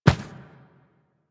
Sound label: Infested Medium Run Default, footsteps; Warframe